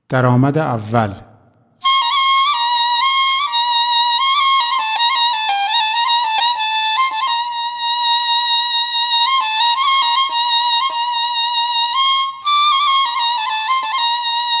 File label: daramad aval; Radif-Jahangiri